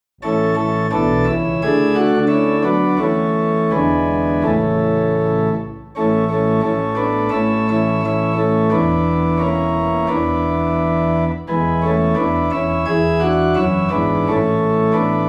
Organ Low in A; Count Your Blessings

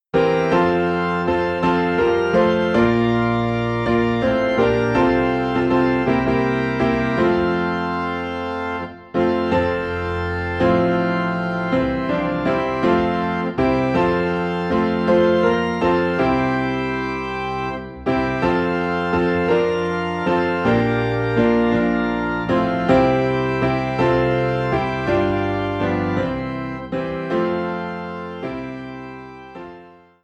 Piano and Organ in F; We're Marching to Zion

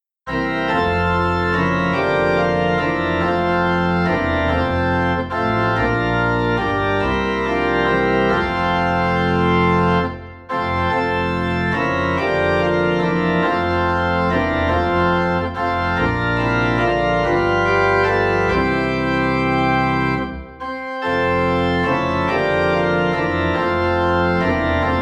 It Came Upon a Midnight Clear - Organ Low in F; christmas

